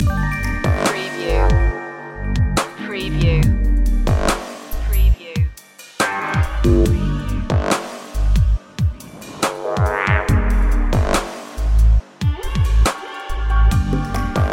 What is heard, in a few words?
stigmata loop